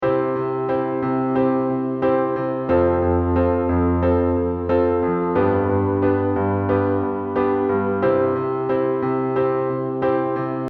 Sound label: 3 chords